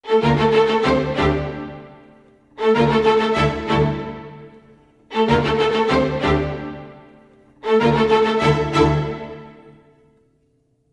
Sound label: 95 strings, Orchestral arrangements, 95 D min